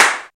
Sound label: Snare, RY30, drum hits; Zero-G; Soundclash